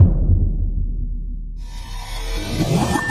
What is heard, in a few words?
One Shot Boom Riser